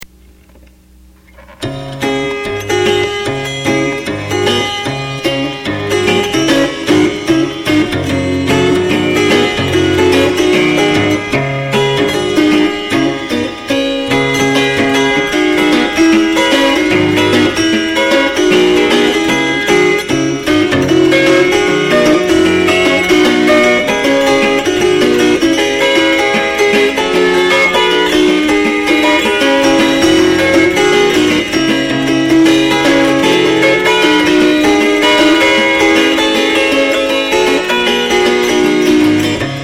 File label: Bass